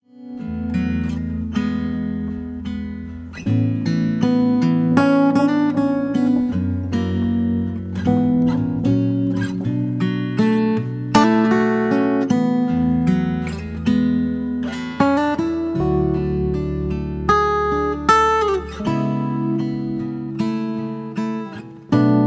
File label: Instrumental